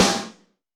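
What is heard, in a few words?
Snare Nasty, Alesis D4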